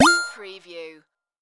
Digital Signal Wa Pling

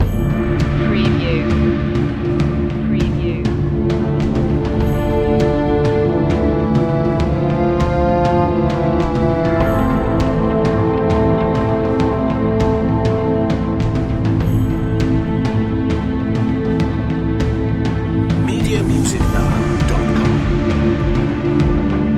ambient army

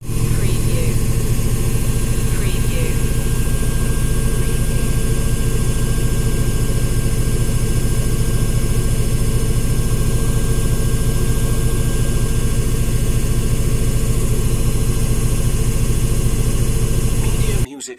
Wshr Spin Cycl

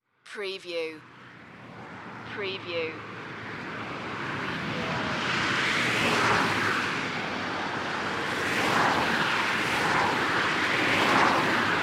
Heavy Traffic On Freeway

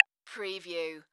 Multimedia Percussion

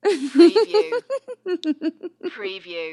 Female Giggle

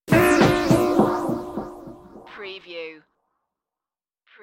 Scifi Audio Logo Ident,Sudden,Echoey,Wah Guitar

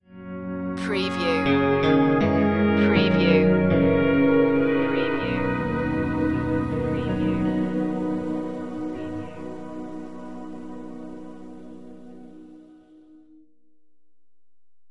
drifting away

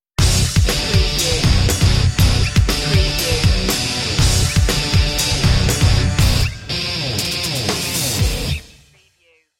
armageddon stinger

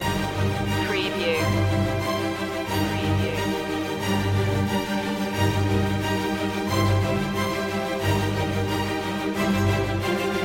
strings mix

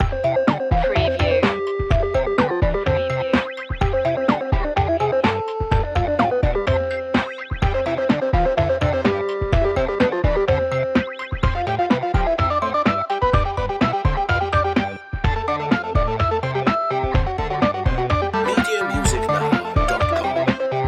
8-bit instincts loop

